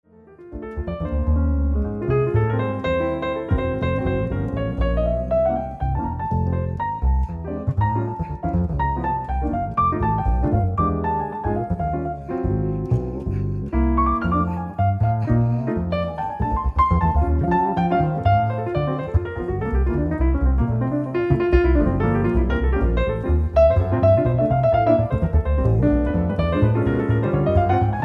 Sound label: ewi piano duet